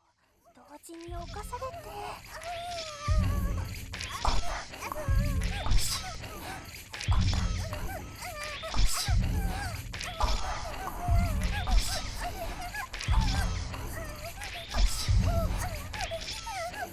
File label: multi orgasm; デイドリームＴＳ機械姦Ⅱ